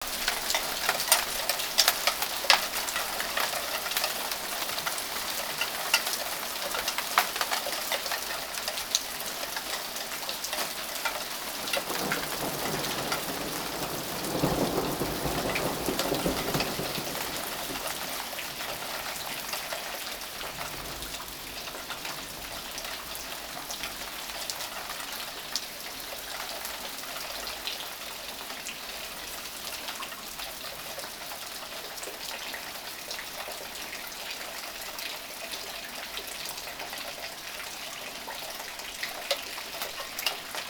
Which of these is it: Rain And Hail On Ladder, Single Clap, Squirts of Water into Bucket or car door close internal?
Rain And Hail On Ladder